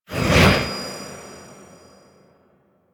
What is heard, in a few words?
Nursery SFX Sword Warning; The War Within; Teshin